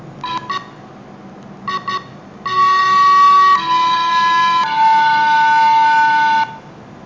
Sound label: Flute Compound